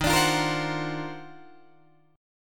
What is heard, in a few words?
D sharp Minor Major 13th, Guitar, DADGAD; strummed